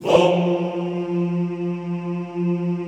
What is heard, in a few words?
VOHMMM F2, Classical Choir; Best Service; Extended Classical Choir